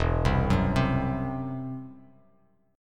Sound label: E Suspended 2nd Sharp 5th E down Bass Standard; Esus2#5 arpeggiated